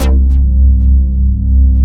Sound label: BIG UPPS, SYNTHS; Pro-Rec - Synthsonic